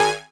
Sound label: Synth Sound; Vengeance Samples; VEE Synth Shots